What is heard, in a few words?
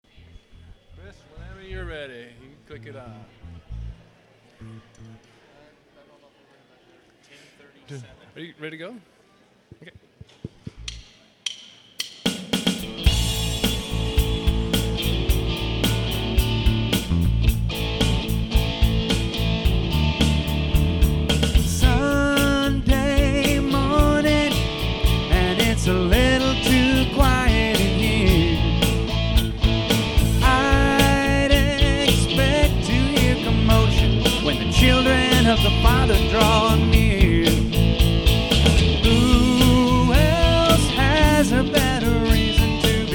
live